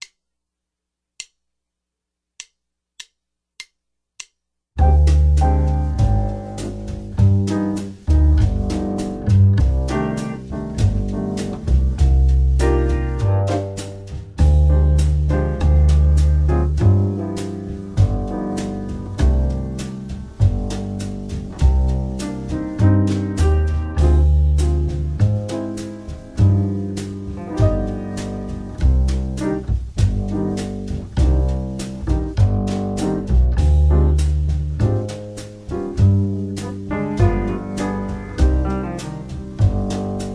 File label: Dm7b5-G7-Cm7 Bossa 100